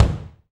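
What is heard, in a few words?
bass drum, Real Kit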